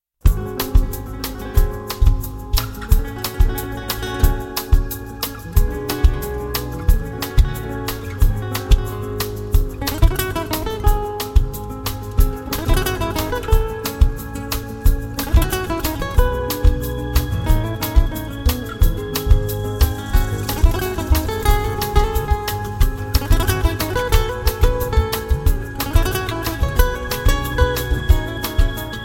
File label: Flamenco